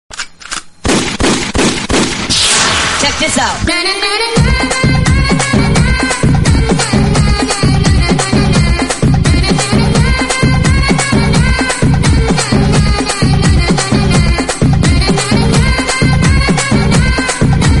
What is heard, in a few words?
Tamil remix ringtone